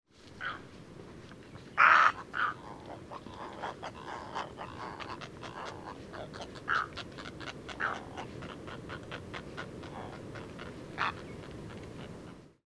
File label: Dimorphic egret